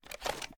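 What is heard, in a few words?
weapon foley assault rifle inspect; weapons; gmod; viper; scharlie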